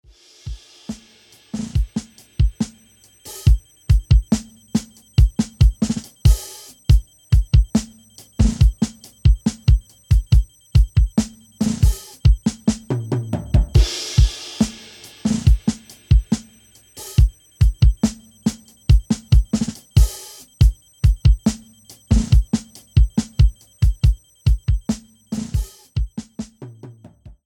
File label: tritone drums; tritone drums no 03; patchbanks